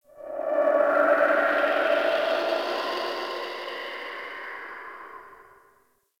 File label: Interior Cave Wind Howls, Infested, Ambience; Misc Indoor